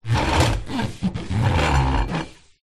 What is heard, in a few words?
household glass stove top wipe clean sponge squeak metal hobs rattle